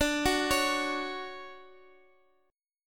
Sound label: D Major 7th, Guitar, Open D